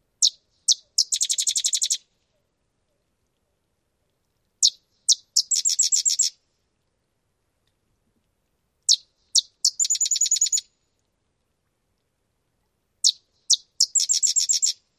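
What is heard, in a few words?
song, Sedge Wren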